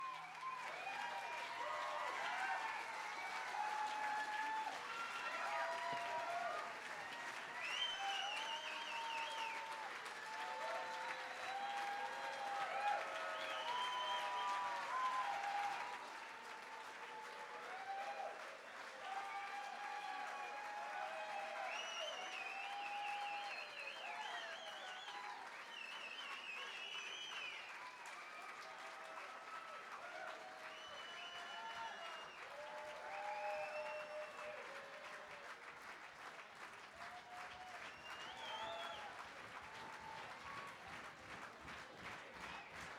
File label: crowd